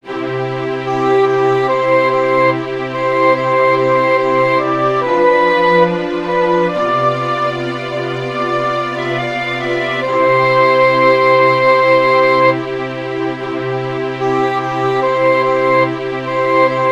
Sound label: english horn string; There were shepherds abiding in the fields